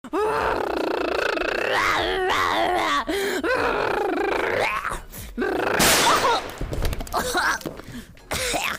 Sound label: creature gets shot